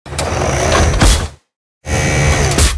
Idle Muzzle Up; Coal

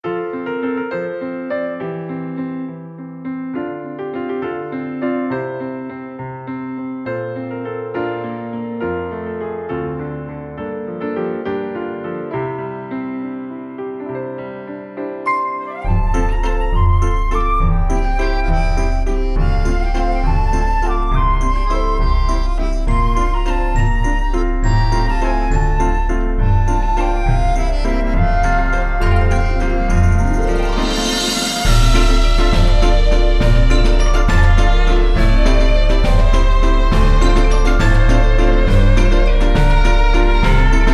Waltz ver.; Atelier 20th Special Piece CD 2017

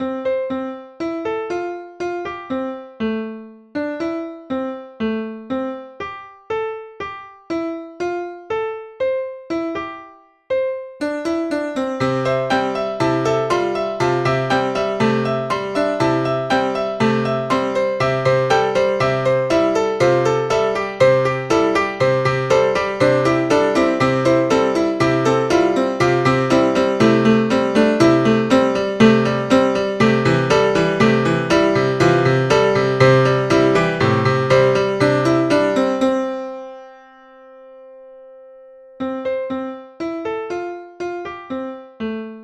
Splendid Piano; SimCity 2000

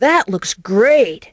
Correct

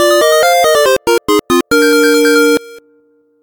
Se Levelup; Secret Summoner

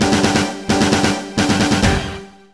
SFX BTL WIN; Yes! PreCure 5 GoGo! Zenin Shu Go Dream Festival; DS

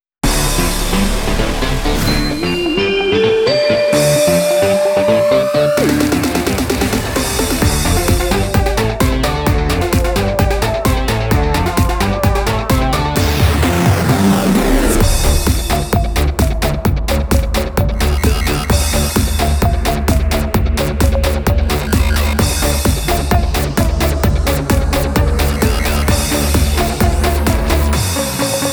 Trailer Music; Alien Hominid Invasion

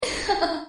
Charms; yet another pixel dungeon android

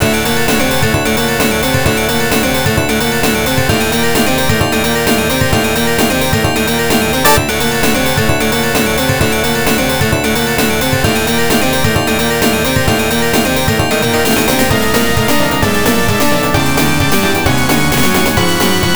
STRANGE BEAT; Urotsuki Doji; X68000

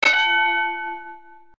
Sfx Bell; Pizza Tower early build test ost

